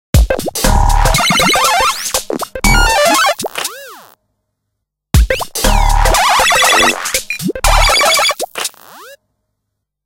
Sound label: Arcade; Nokia; Nokia Tones; Windows Phone apps